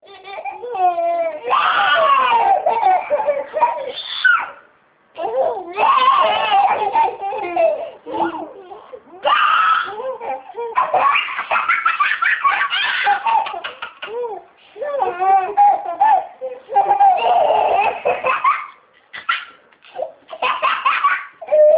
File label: bedtime laugh; scotland